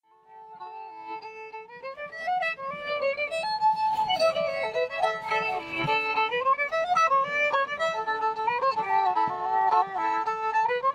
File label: 19th Century Period Music Violin